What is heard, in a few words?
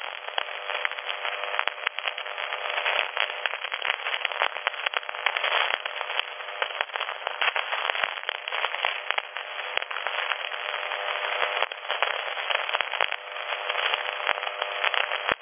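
Thunderstorm Interference Around 90khz Weird Tuning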